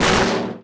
bouncy table iron hit; bouncy objects; Tony Hawk's Project 8; XBOX

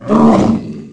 Swipe Right, Ice Beast

